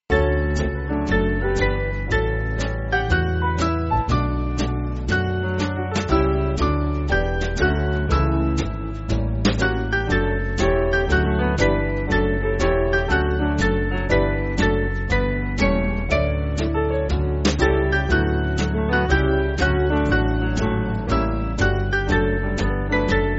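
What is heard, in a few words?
Band, Music Backing; Keep Up The Song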